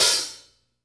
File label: OPEN, HI-HATS; Sampleheads; New York City Drumworks VOL-1; SP HI-HATS